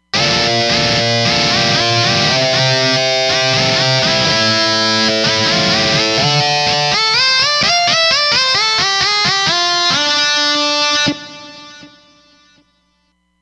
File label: Sync Mod, Roland Fantom XR